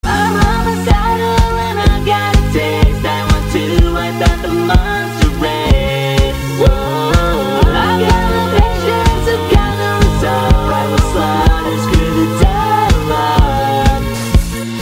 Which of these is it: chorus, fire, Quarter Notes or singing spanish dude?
chorus